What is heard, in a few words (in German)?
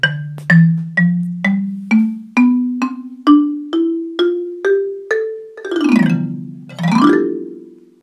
Bassxylophon